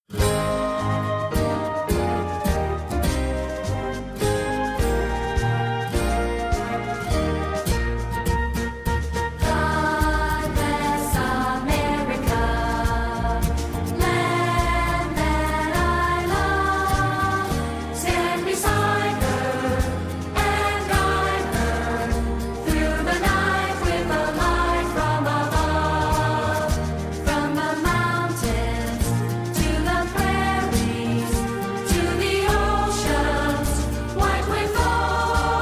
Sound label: uptempo